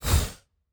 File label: Door, Sci-Fi Sounds